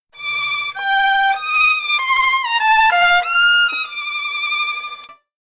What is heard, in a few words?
sad violin; mr krab